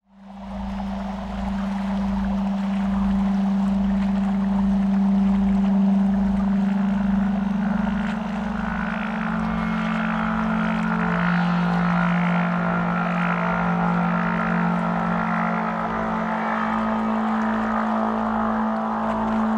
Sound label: Sky sounds